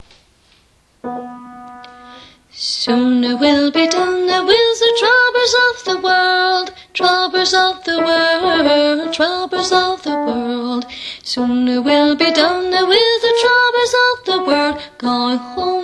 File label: soprano